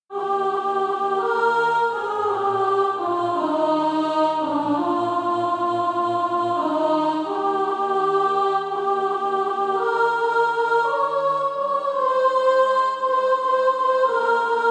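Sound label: soprano; SATB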